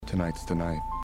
dexter message, ringtones sms

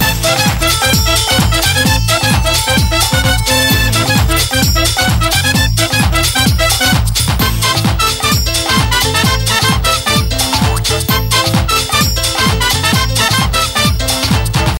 Latino Sound, ringtones

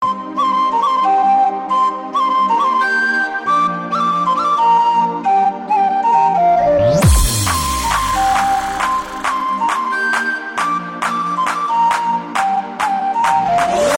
Flute

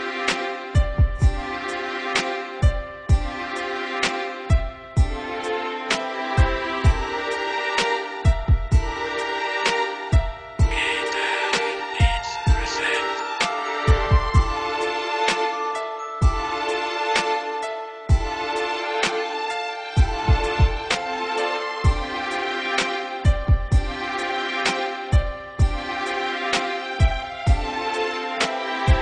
Deep Beat

